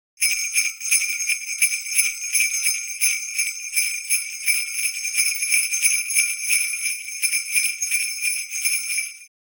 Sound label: Sleigh bell christmas jingle bell ringing